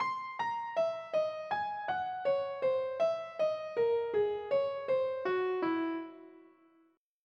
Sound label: altered scale